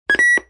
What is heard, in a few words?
BeLeep; noisetoys